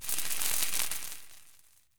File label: Mortar Fireworks